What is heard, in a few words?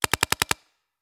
Paintball Gun; Sound Ideas; Guns